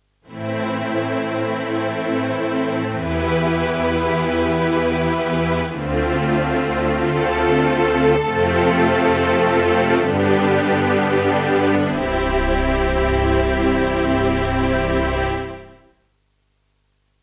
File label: Alesis Prestige Artist Strings